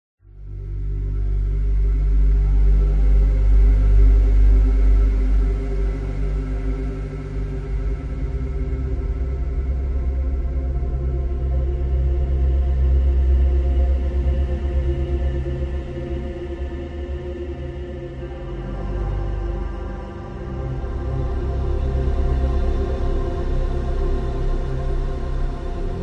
sound design cinematic drone airy epic glorious with dark hints; zapsplat